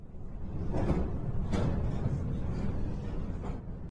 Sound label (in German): fahrstuhl fahrt; minecraft